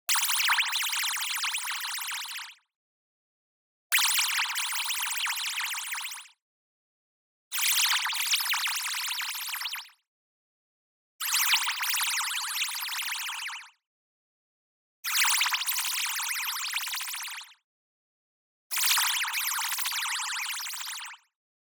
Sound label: wobble, high shimmer; boom library; Anime Essentials; DSGNMisc